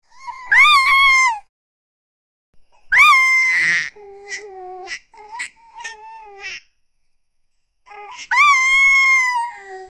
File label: baby deer show cute loud